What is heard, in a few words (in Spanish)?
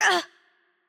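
Hurt Light, Spanish; es ES; VO; bloom minigame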